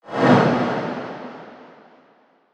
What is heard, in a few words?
Techno FX 135; AC2024 - Day 1 - Techno Sounds 2025